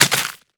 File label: small creature footstep snow; Monster Within; soundmorph